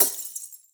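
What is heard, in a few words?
light bulb break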